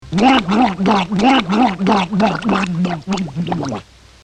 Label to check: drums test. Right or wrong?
Wrong